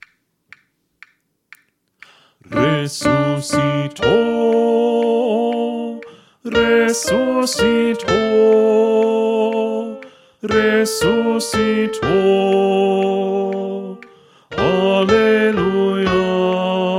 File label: Refrain Alto